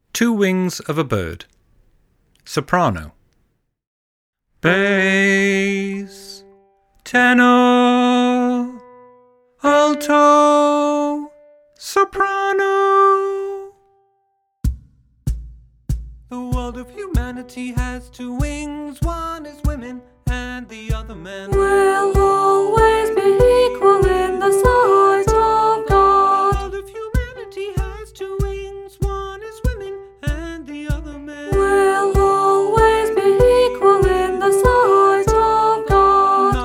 soprano, choral music

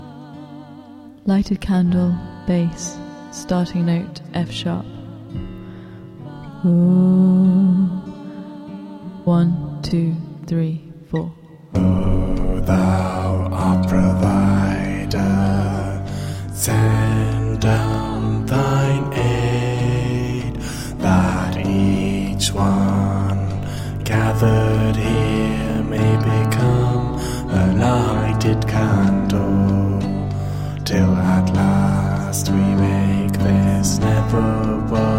bass, choral music

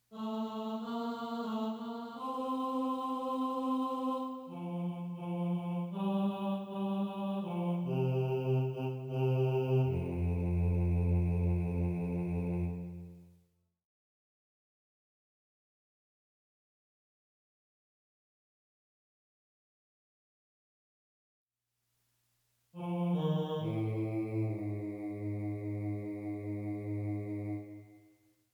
bass

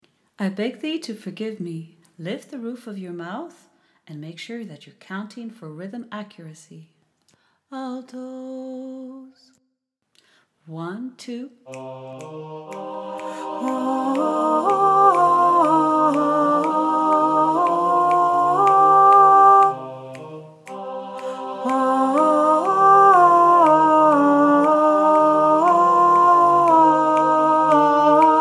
alto, choral music

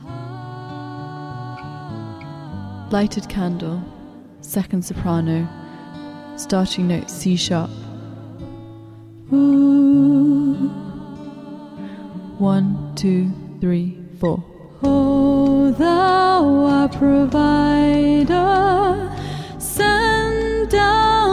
soprano, choral music

